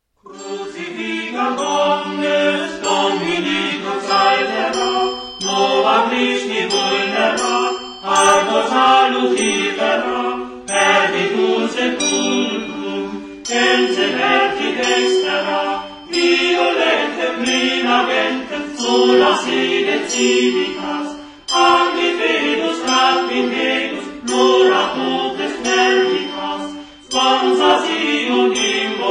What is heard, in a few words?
organum; Crucifigat omnes